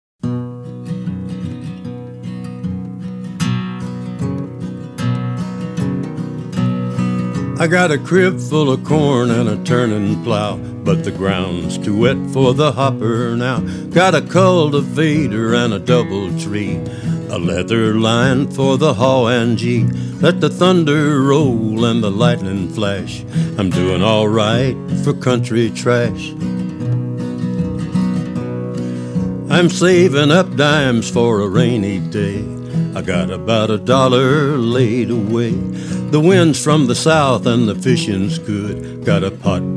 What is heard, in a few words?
Country Trash